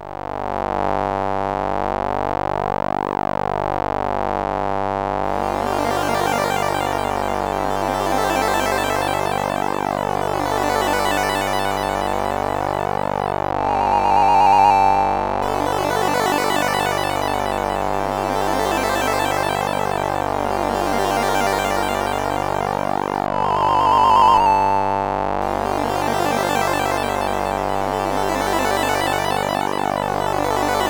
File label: Intro, CSG8580R5